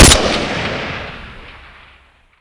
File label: ak47, weapons